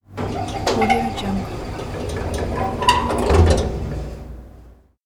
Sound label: old hotel elevator doors closing outside; soundcreator